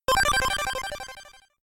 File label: Laser A; ZeroRanger